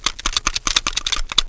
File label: widow lookat loop, knife; cstrike; csgo